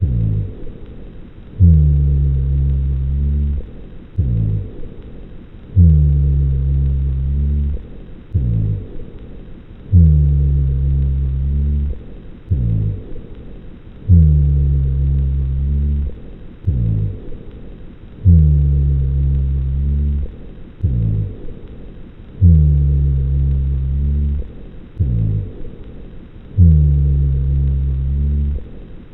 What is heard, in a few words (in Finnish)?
rohina, hengitysäänet; ääninäytteitä auskultaatiolöydöksistä